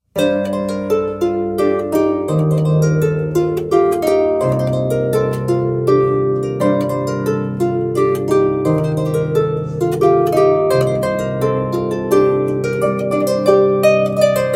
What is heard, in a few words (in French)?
harpe